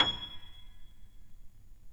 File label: PNO MF; Synclavier Sampler Library